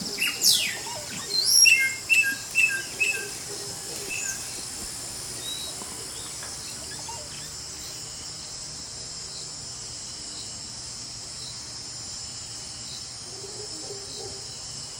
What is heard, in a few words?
meso american forest, soundscapes